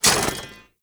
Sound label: Animal Rescue Cage Locked; Encounters